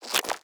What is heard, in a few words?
Level Up Bonus; mighty party 2017 gamerip pc ios android